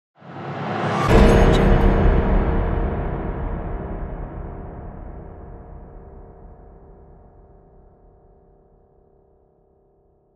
danger piano stinger

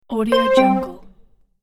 multimedia remove action prompt

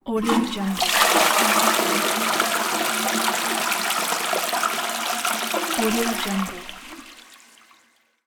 toilet

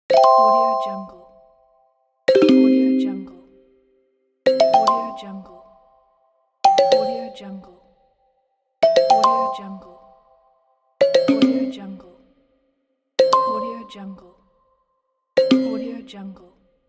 win lose game achievements

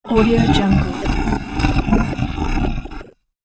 giant lizard growl

